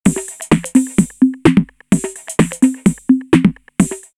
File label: 808 128, drumloops, DrumMachines; X-Static Goldmine; 90 s Sample CDs